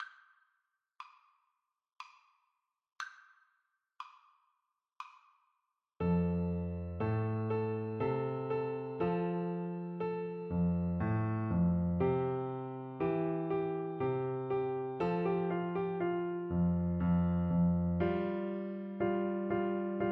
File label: Minuet, Flute; from Don Giovanni